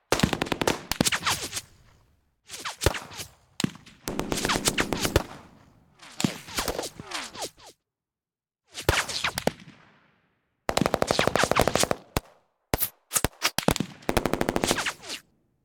fs Shoot Out Idle; narcos

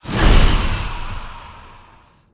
hell Symbol Land; archangels

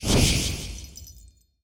win Line Flash; fairyred

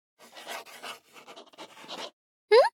Map Open; magic maid cafe

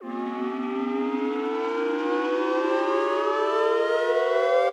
near win; robin hood

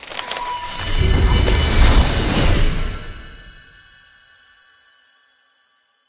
tree Illuminate; wilderland

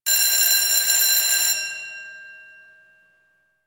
free spin bell; spinsane

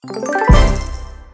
medium win; pork knox

